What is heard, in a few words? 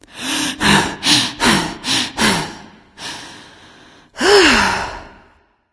breath; smite; nuwa